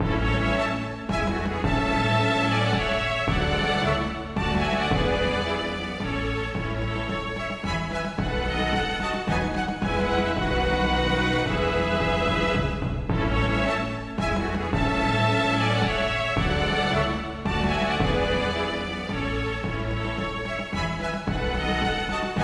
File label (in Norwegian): Menuet for strykekvintett med ad lib trommer, m trommer